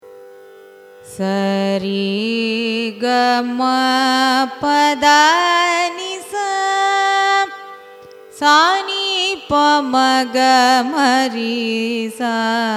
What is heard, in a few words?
raga nattai arohanam avarohanam; carnatic music